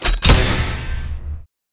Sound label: drop